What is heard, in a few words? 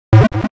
Wobble; Pokemon